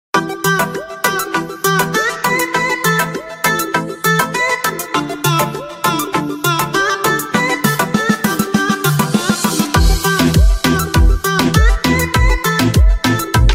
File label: Beat Reggaeton Instrumental